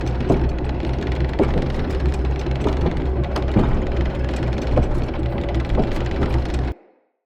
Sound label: Winch Loop; UnderCity